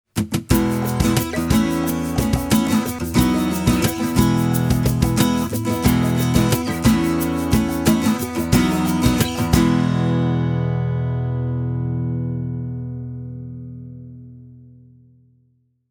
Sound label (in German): Westerngitarren Takamine GN10NS; Rockway